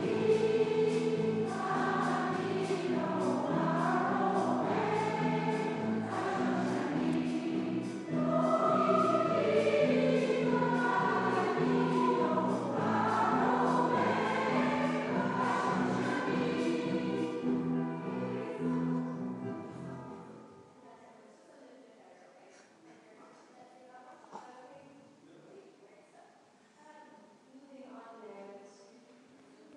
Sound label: vocal arts